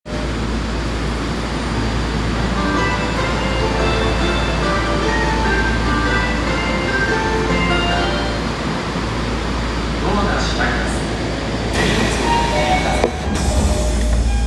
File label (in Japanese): 丸ノ内線中野新橋東